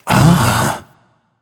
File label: notice; smite; voicepack; guanyu